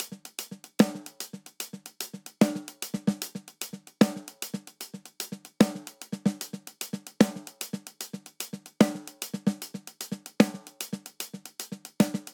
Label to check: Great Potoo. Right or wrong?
Wrong